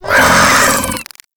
Desert Skate Vocal Death; Warframe

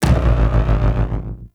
Beam Fire; Enemies; Abilities; Ethereal; Warframe; Zariman; Void Angel

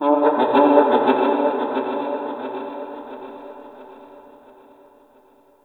Forest Distant Misc Animal; Warframe; Grineer Forest

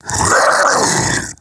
Vocal Pain, Infested; Splitter Split; Grey Strain

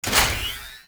Ship Fighter Platform Start; Corpus Ship

